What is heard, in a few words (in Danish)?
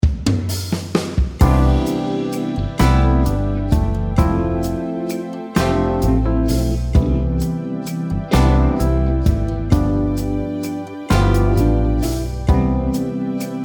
Akkordprogressioner C dur C instr u mel